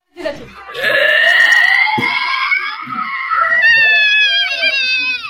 death scream; meme

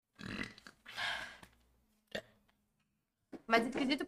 burp

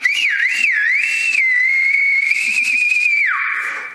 whistle in hole

